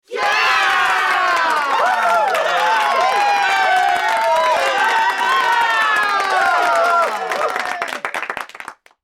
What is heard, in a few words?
Crowd Applause and Cheer